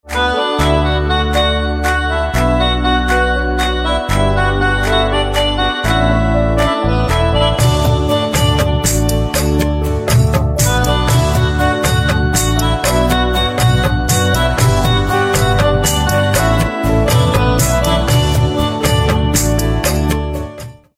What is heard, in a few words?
Pianica Instrumental Ringtone